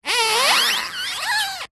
vehicle wheel turn tyre squeak road close up isolated clean